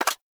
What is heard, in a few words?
Weapon Justice Rifle Fire Mech; Warframe; Caliber Chicks Minigame; Weapons